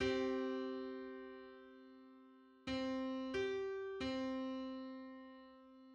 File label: Seven hundred fifty ninth harmonic on C